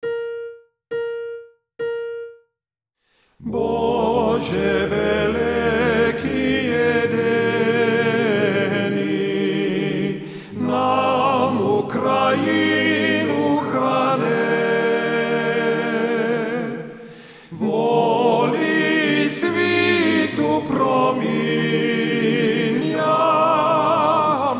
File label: Prayer for Ukraine TTBB Tenor 1